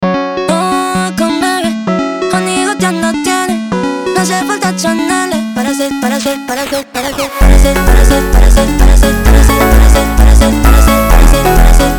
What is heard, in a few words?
aria funk